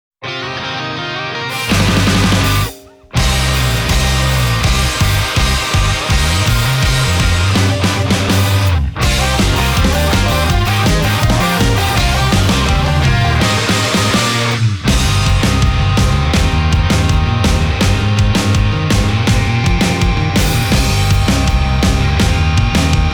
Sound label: Instrumental; Gintama